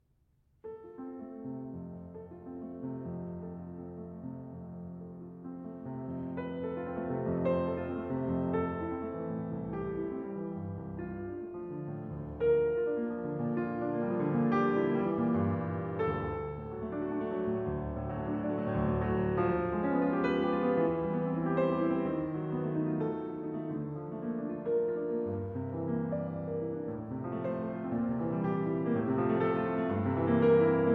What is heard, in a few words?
Carillon